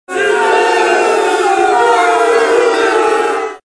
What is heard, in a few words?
Crowd Boo; Dance Dance Revolution Extreme; PS2